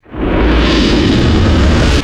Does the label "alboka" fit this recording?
No